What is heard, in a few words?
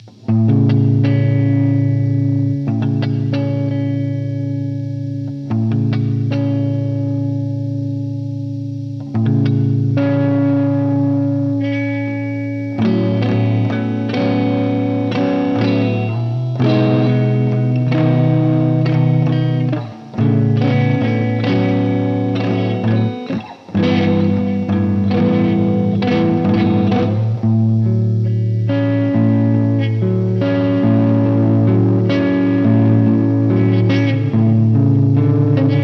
Sentimental Guitar; Hylics